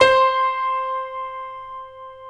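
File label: six pianos, Distant Stack; Optical Media International; Sonic Images Library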